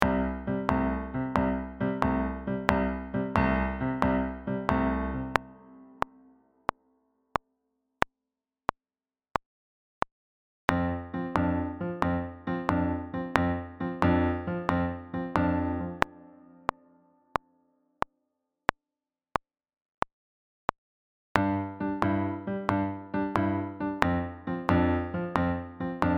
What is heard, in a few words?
blues piano 12 bar blues